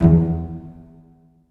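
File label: cello pizzicato E1, Virtuoso Strings; ILIO; Kirk Hunter Virtuoso Strings VOL-3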